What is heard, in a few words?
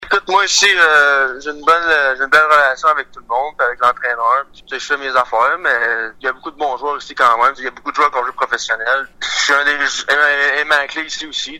CLAP; Le hockeyeur gaspésien Christopher Clapperton